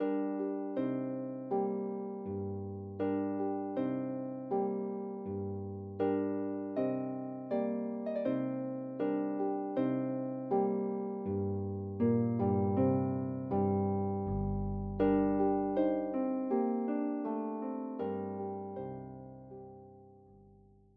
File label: Harp; Famous Music for the Harp Vol 6